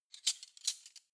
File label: cutlery spoons handle